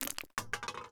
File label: Recycle; Windows Vista